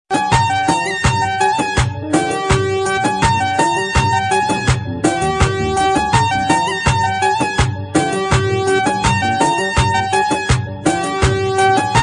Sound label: snake flute; msn sounds